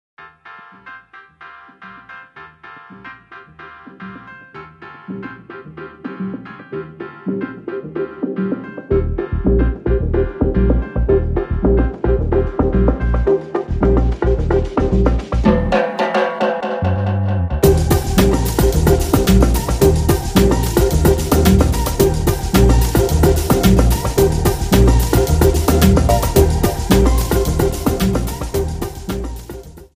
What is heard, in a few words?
Cha Cha Cha; Noisebuilder